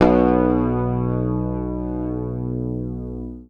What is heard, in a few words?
B1, Bass Library, PICK; Sampleheads; Will Lee Bass Library